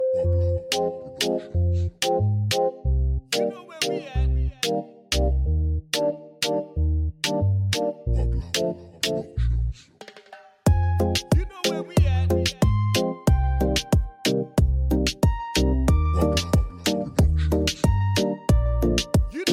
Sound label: Latin Reggaeton